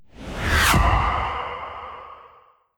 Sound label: Swoosh; Pandora